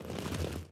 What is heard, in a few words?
struggle foley